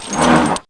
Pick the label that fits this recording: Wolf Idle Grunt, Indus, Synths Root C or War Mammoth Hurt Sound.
War Mammoth Hurt Sound